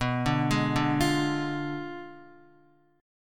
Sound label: B Diminished 7th B down Guitar; Bdim7 arpeggiated